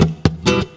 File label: GUIT CHORDS; Best Service; World Colours